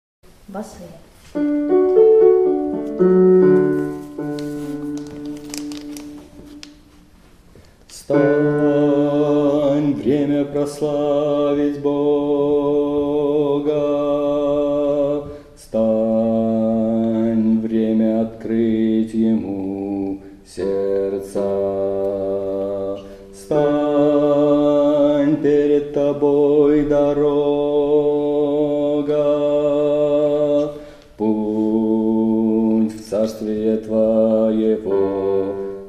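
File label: bass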